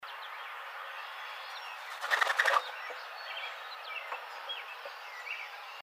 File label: Mourning Dove, birds